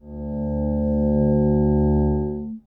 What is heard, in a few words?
bass resyn